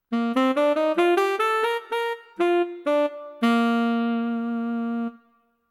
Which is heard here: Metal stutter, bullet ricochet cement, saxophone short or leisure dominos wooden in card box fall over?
saxophone short